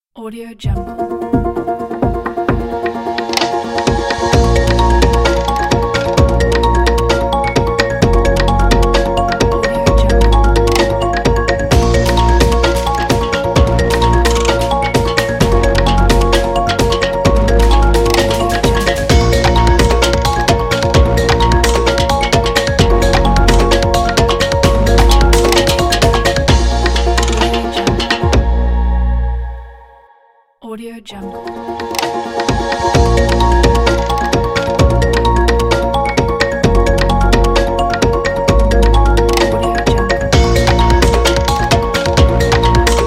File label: future technology science logo